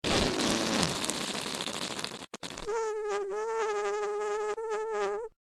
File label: whoopee; Undertale